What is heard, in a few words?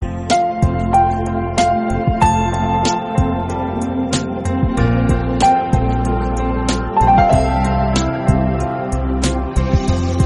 soft refreshing contact tone; samsung ringtones